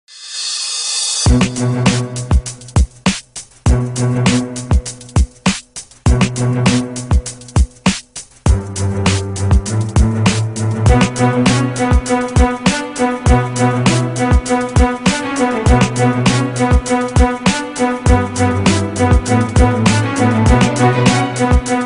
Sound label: Violins and Cellos